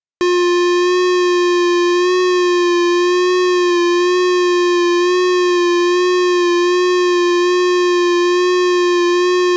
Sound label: HW40 Drag